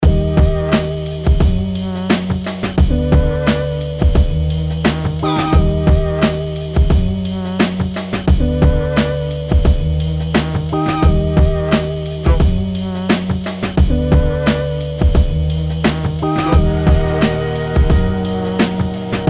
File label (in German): 90er beat